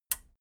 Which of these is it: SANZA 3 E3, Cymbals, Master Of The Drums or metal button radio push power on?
metal button radio push power on